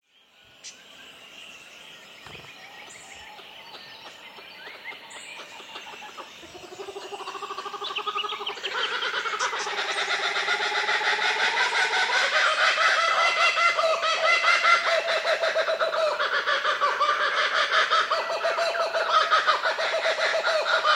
Kookaburra

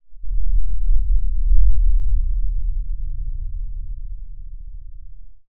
Distant Explosion

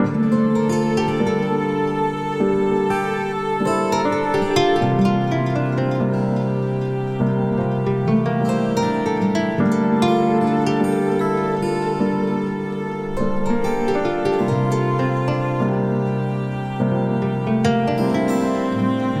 Gliss

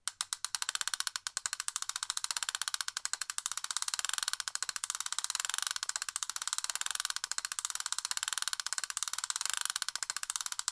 Toy Ratchet Spin

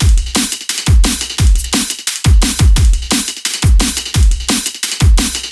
174 Drum Bass Loop

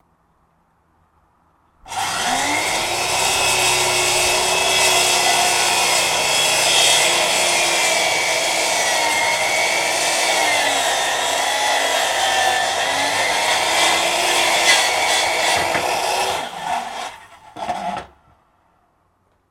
circular saw

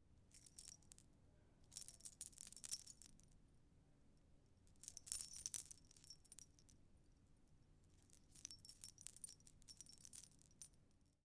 Small Metal Objects Shaken

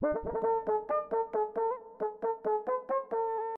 Yiddish synth

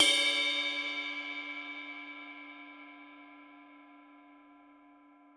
Ping Ride Hit Bell

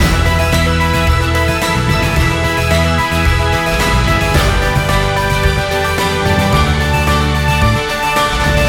News Loop

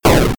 8bit Explosion

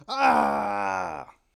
Male grunt Close Mono

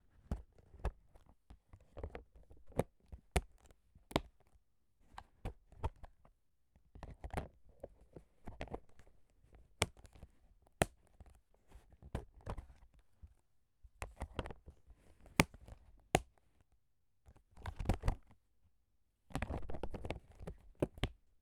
Lunch Box Open And Close

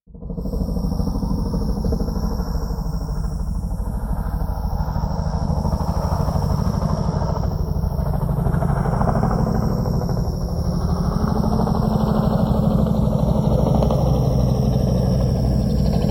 Helicopter Fadeout

